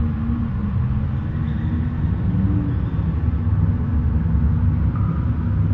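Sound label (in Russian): ветер низкий приглушенный монотонный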